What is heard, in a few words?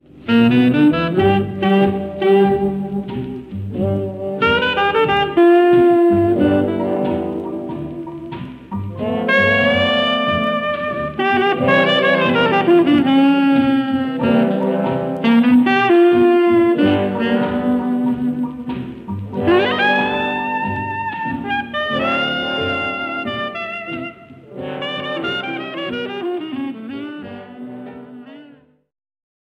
blues